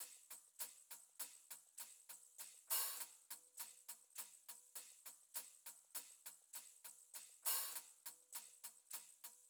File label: Drum Loop, 101 BPM Percussion; Loop Stems; Cymatics; DOPE DRUMS VOL. 1; Red Room